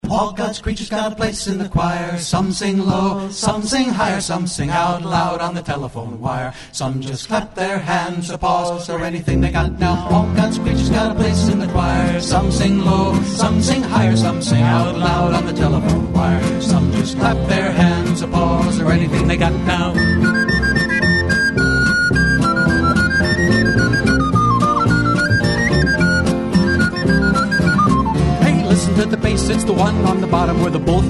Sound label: choir